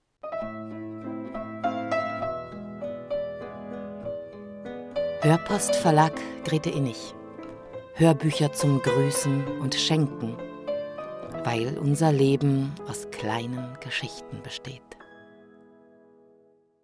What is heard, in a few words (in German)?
hoerpost jingle